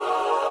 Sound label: heal aura